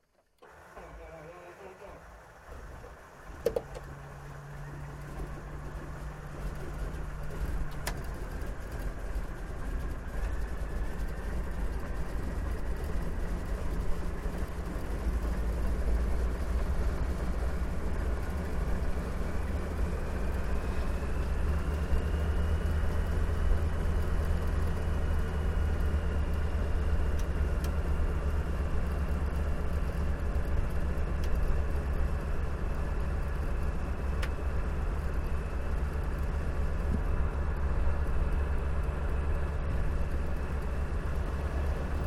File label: AIRCRAFT CAC CA-25 WINJEEL STARTUP INTERIOR, fighter trainer; RAAF Winjeel; Airplanes; Vehicles